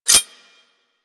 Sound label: Whip Mech Rotate; Grineer